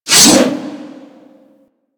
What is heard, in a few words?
Great Sword Swipe